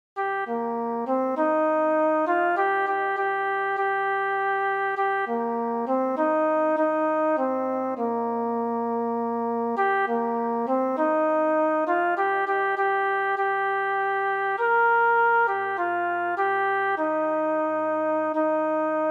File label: Nobody Knows the Trouble Ive Seen soprano